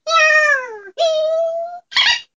jaaa mmm hahah

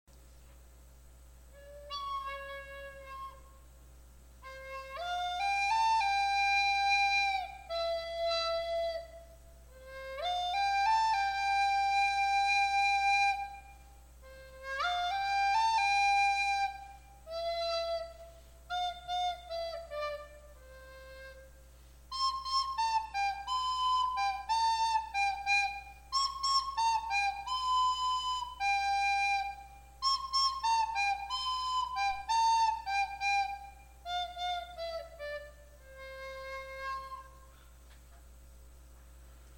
in flute with effects

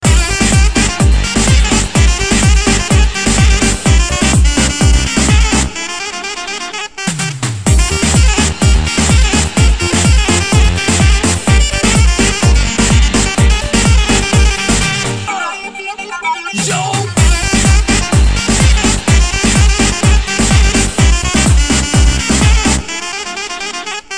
Balkan Beat

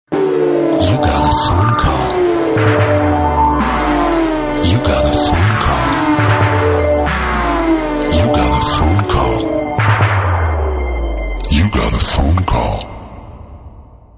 phone call; ringtones

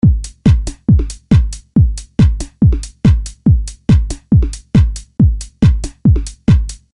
E House beat

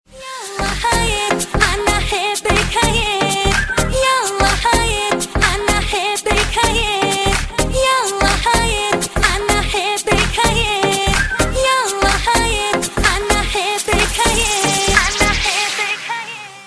yalla cool ring

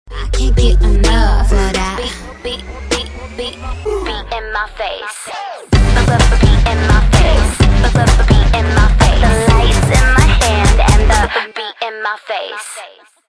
beat

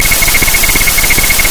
sheep ringtone; animals

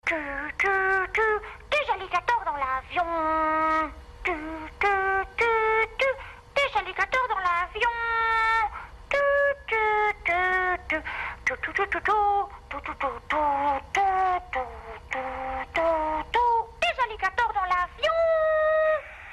gumball machine

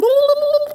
Idiot blubber sound

